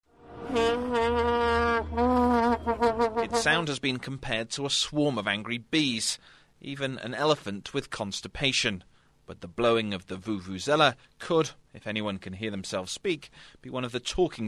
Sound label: vuvuzela; voanews; bbc